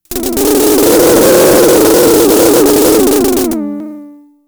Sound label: FX, Drum Machines; Fricke Schlagzwerg